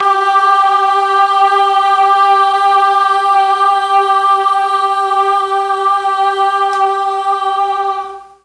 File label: "G2" boys choir, mellotron